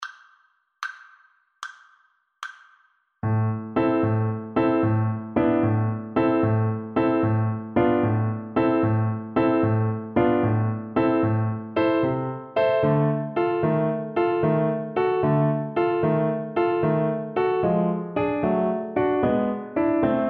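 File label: recorder karaoke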